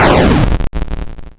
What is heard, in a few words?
explosion; color maze